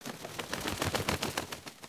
wing flap loop long quiet; creatures organic

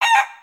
cluck; creatures organic

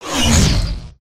electric fence whooshby; ambience

